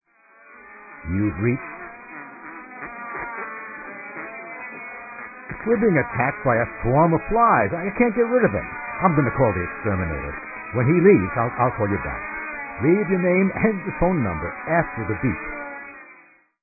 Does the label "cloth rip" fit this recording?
No